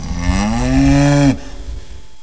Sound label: Cows